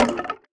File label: bamboo destructible